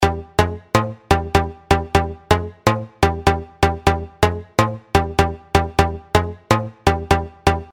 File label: synth